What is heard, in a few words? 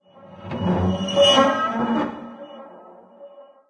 metal groan